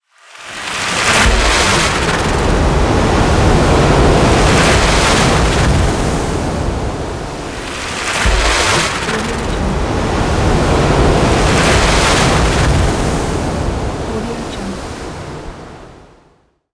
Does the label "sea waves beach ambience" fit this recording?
Yes